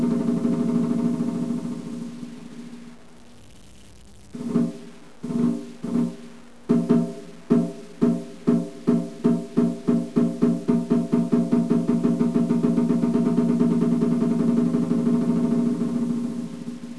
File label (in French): tambour